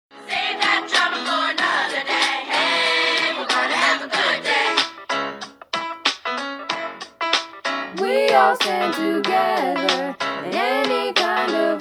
girls song; chess

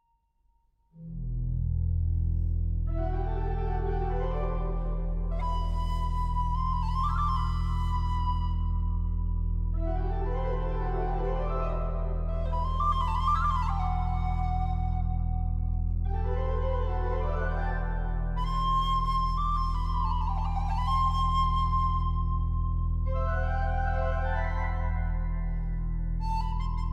Partita

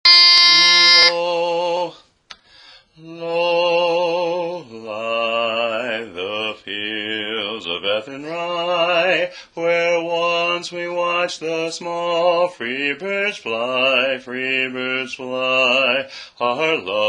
Bass

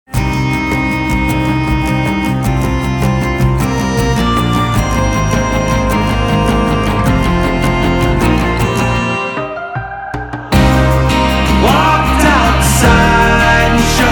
Acoustic

